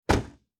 Auto Close Door Exterior

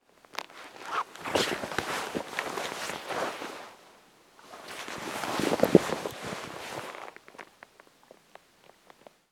Clothes

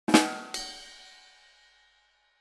Rimshot d dum ping

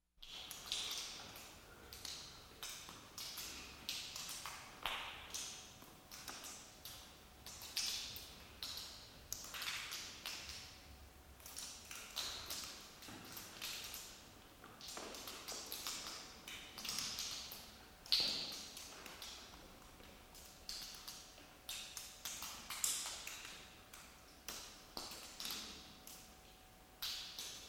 Cave With Water Dripping

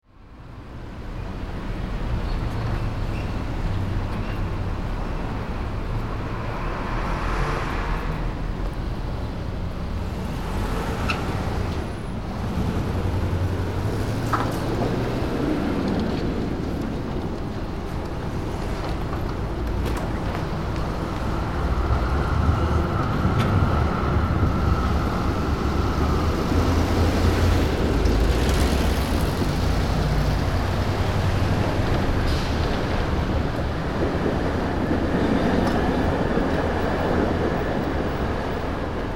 Traffic City

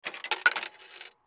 Telephone

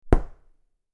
Impact Knock

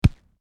Body Hit

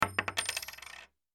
Coin Drop

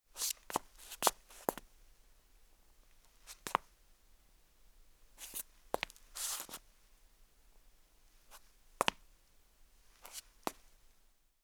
Footsteps Hard Marble Scuff

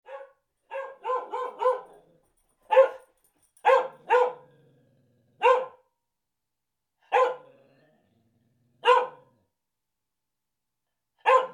Dog Bark Medium

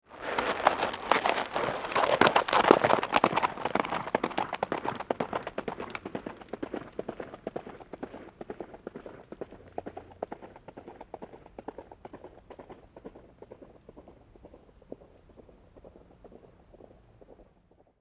Horse Gallop on Gravel

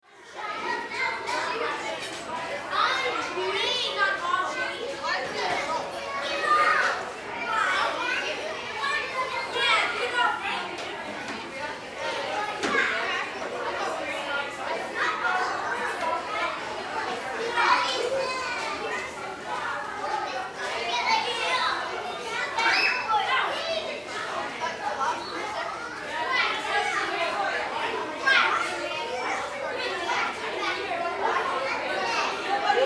Crowd Walla Children

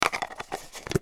Plastic Container

